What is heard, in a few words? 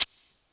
Shaker, retro house samples, Drum Hits